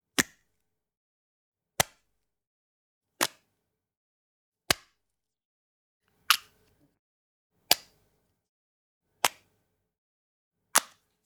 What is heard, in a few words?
Marker Open And Close